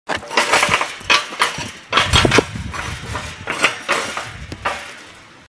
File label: metallic rattles